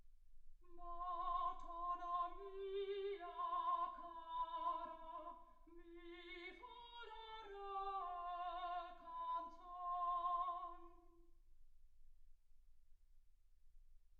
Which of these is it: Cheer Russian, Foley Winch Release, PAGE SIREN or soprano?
soprano